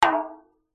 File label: impact metal light hit cooking tin water inside